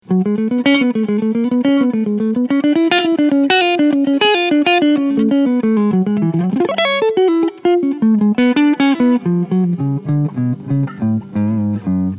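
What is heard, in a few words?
Front Pickup Clean Picking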